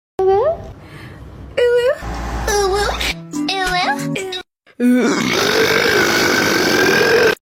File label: uwu burp